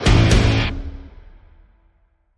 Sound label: Guitar Double (A); STAB